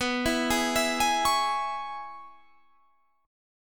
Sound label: Db Minor B; arpeggiated; Dbm/B; JGuitar